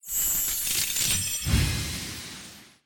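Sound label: key Presented free spin; codex of fortune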